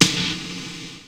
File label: Snare, Fairlight CMI III